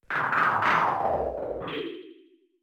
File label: enemy death; deathworld3